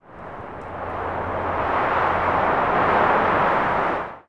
field wind

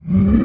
golem attack

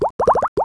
spirit water s die

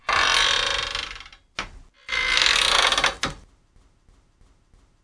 propeller

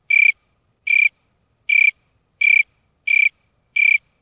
grass bug

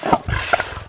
baphomet breath

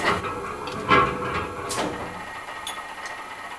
subterranean rusty engine

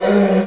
shelter attack

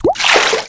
spirit water attack

